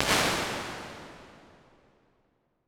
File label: Huge Room; Large Spaces; Rooms; Impulse Responses; Apple